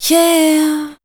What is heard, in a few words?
voice spectral, ly short